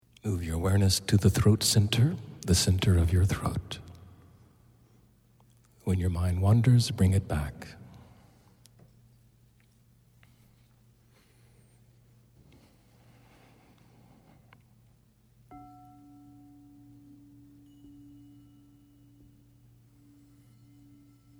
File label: Sound Meditation; Fifth Chakra Vissudha